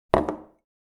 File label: Glass plate setting down on a table